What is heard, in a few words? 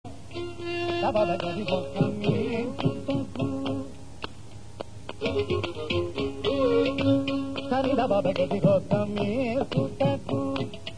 bilahari swarakalpana; manodharma; paridhana